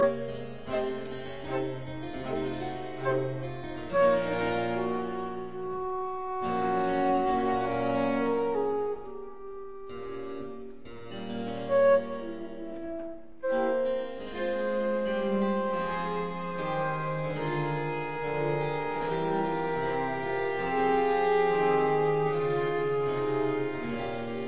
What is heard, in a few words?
Quartet Adagio; Quatrieme livre de 6 quatuors; No 1 in D major; TWV 43D4